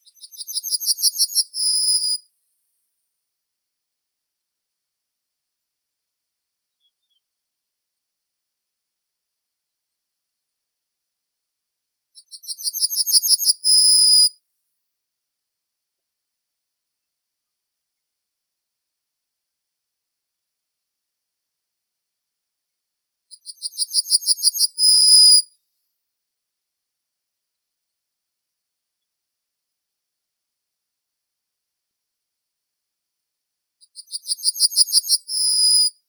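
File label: yellowhammer, Emberiza citrinella; ovsyanka obiknovennaya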